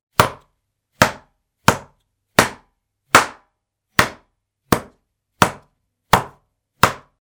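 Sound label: slipper sound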